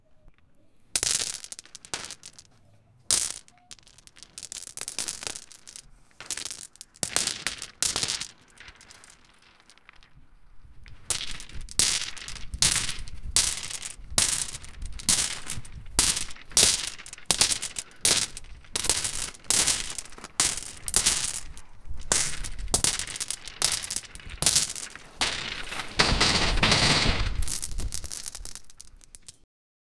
multiple stones being cru

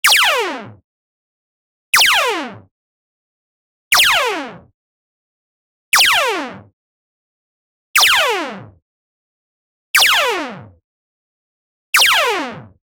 sci fi gun shoot cartoon

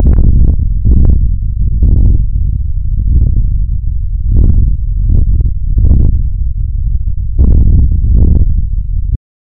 thunder rumbling in the, melodies

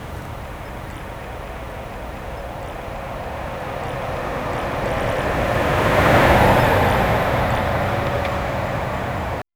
sound of a pickup vehicle, offroad

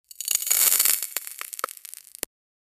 ice magic that was quick

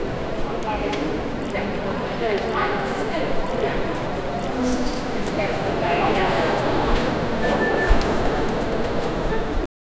airport ambience, announcements

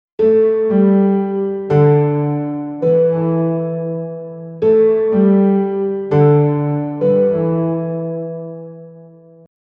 adventure walking piano

